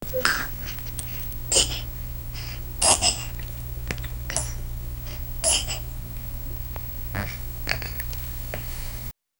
baby burps; digestion

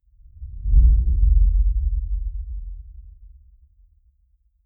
abrupt silence followed b, sting

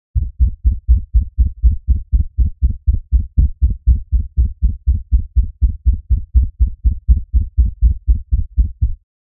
futuristic lab ambie; neon

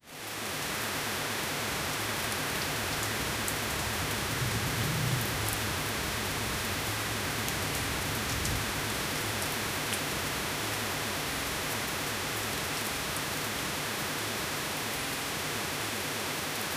soft smooth rain ambience; tranquil

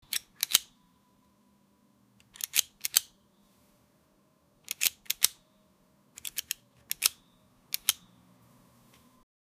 multiple locks click

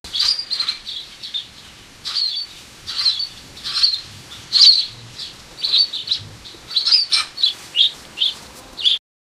parrot, tropical

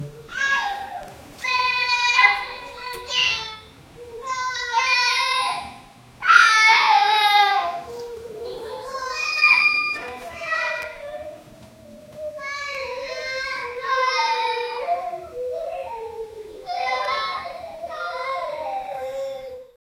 small children crying, whimpering

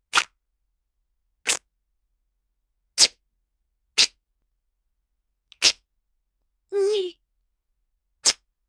lip jumpscares, bite

